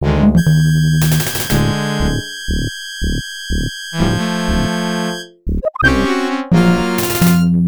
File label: Death; Another World; Genesis; Death (Long)